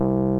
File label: ELEC. PIANO; OMI - Universe of Sounds